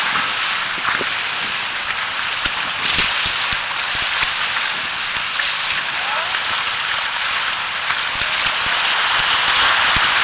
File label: Bass Harbor Head Eider